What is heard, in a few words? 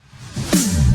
Big Snare